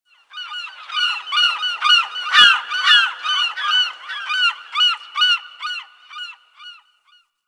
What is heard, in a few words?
Beach Seagull; Ambience; Warframe; Grineer Beach